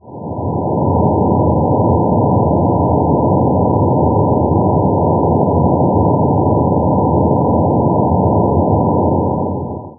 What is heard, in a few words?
noise high